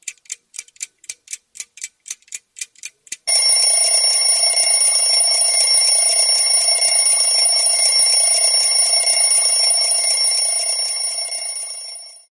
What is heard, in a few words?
clock alarm ringtone